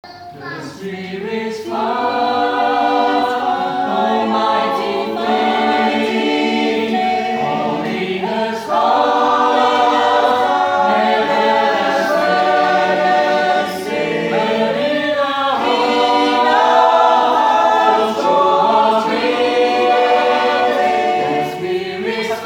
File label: alto